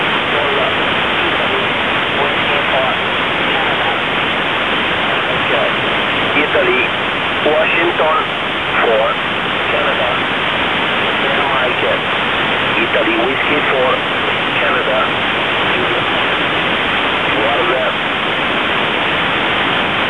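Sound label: 24 GHz single sideband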